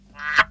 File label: red eye call, frog